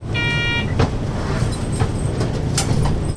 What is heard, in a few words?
door